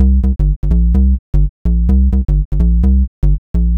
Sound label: Bass, 127bpm, Nu Dance Electro; Zero-G; Soundclash; Combi Loops; Republic